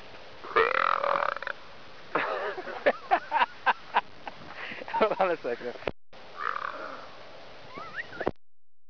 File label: belch